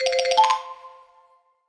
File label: Achievement Unlocked